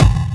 PERC FX

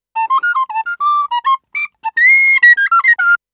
Penny 103bpm F, Penny Whistle; African; Quazibeat; African Secret

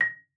MARP A#6, MARIMBA; Xsample VOL-4

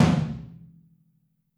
Drums Percussion Kit1 Room Mic

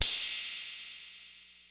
Ride, Ensoniq ASR-X Pro

